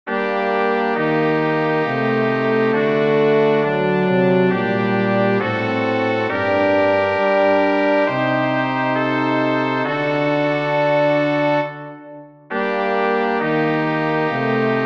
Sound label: choral for brass quartet; bach johann sebastian; daa nicht ewig schande gest tragen